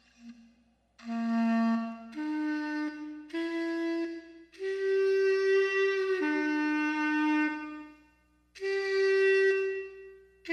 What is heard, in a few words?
clarinet